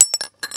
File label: ammo casings